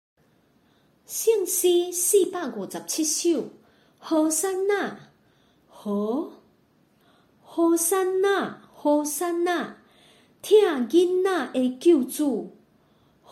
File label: Chorus, children; hosanna